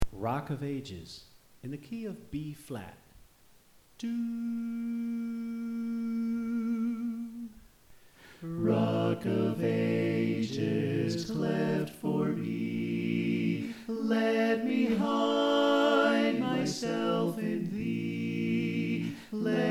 Tenor Left

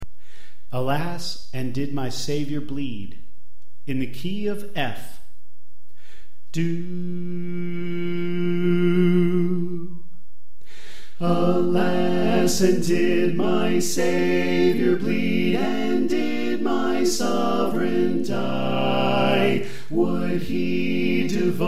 Alto Left; Alas And Did My Savior Bleed

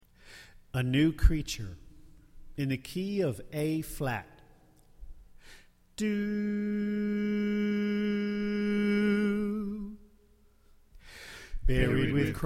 Tenor Left

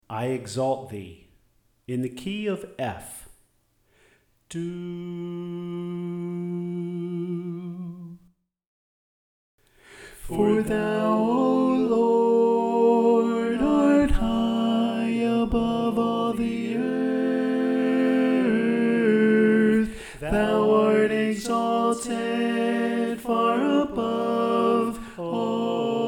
Tenor

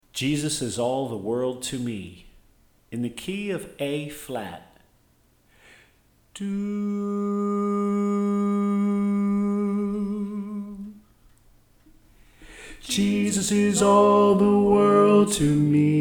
Tenor

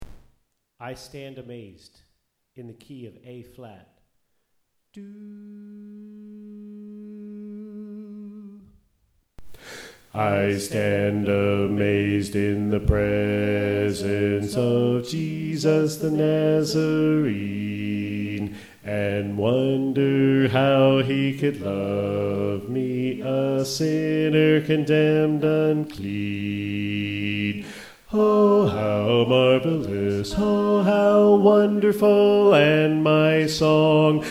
Bass

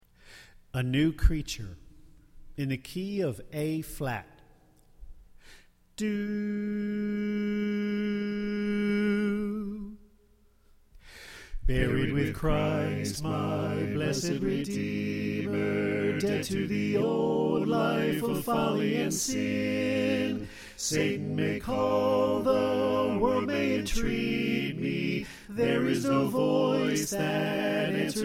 Soprano Left

